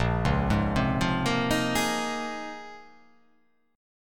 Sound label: Bb Minor 11th, Bbm11 chord; arpeggiated; JGuitar